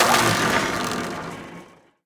Broyeur Block

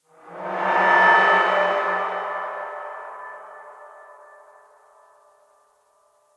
Amb Canalisation Amb OneShot

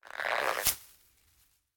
Crak Pousse; Puddle Plus

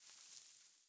Colision Feuille

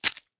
Crak Sec; Puddle Plus

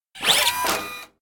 Meca; Puddle Plus

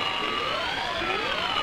Jauge Loop Short

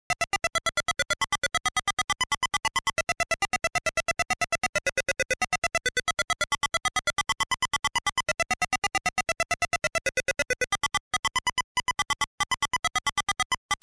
LA VALSE; Xanadu Sound Collection

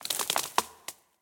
Crak Feuille

